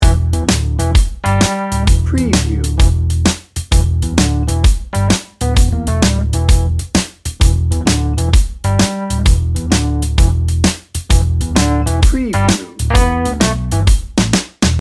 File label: day trippin loop